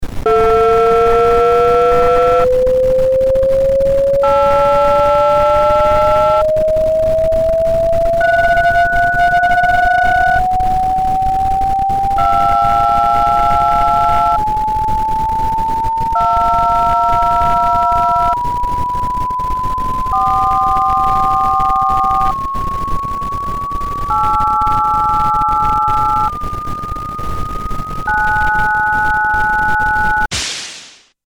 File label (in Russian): dumaet i zavis, звук, где человек думает или завис